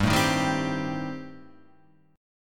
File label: A-Minor 7th Flat 5th G, chord